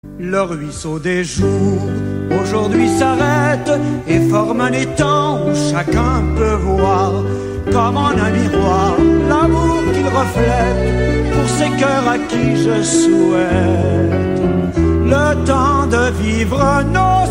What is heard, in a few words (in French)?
hymne quebec